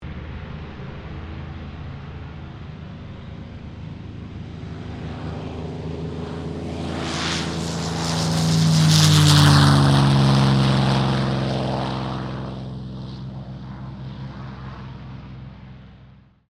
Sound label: AIRCRAFT CA-18 MUSTANG TAKEOFF; P51 /CAC-18 Mustang; Airplanes; Vehicles